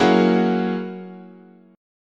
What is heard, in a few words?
F sharp 9th, chord, guitar